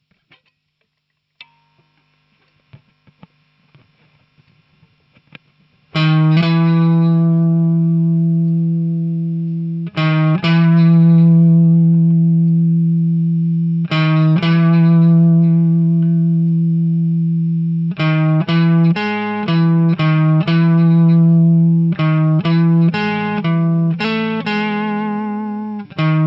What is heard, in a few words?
Amp Room JMC Fast Clean; Softube Amp Room Bundle